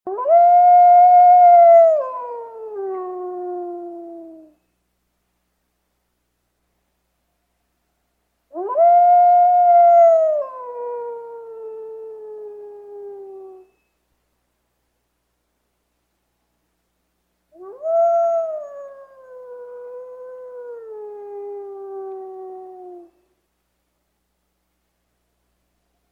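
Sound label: long howl at the moon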